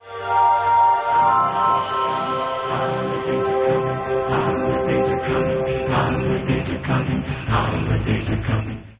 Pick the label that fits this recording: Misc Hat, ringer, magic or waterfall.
ringer